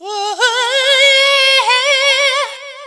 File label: woo; awe32